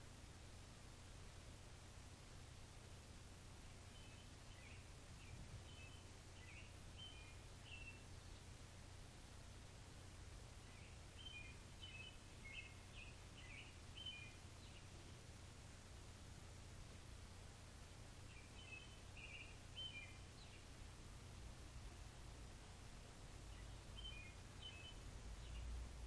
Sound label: morning bird song